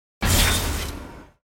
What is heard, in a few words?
tier wings promotion; ranked; from emerald